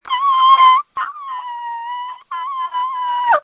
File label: Scream